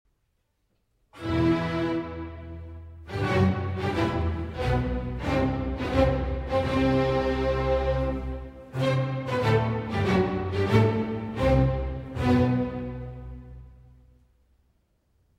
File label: Piano Concerto in G Major